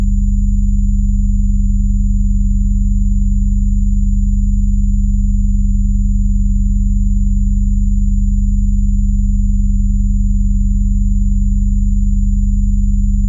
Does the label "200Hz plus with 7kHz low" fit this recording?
Yes